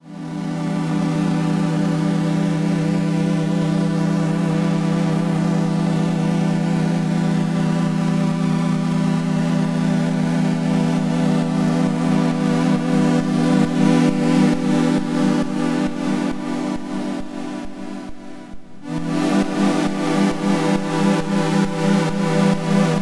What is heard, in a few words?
Sidechain Balance; Waldorf CMP1